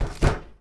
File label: Book Place Metal Shelf; SFX Kit; Foley - Misc